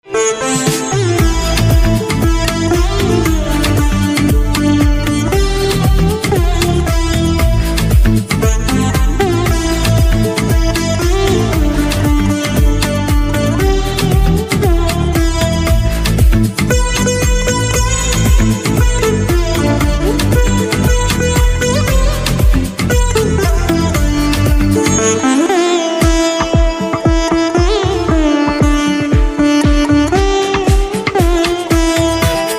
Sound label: Veena